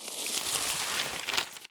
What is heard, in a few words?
scroll